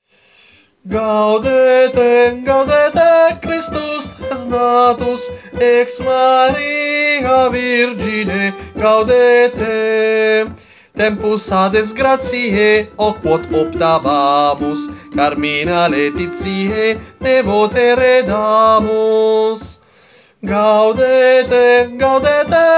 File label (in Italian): tenori, coro; Coro Isola del Giglio; Gaudete